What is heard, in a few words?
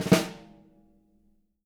snare ruffs, JZ XSNARES; Sampleheads; Peter Erskine Living Drums